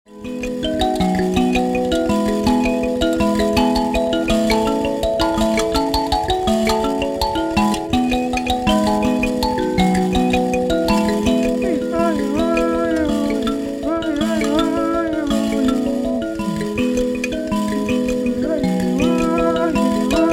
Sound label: mbira